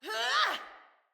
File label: Attack Heavy Female; Battle Barks; Envoy